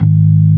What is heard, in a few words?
ORG B3, organs; Sound & Vision; Gigapack I CD 2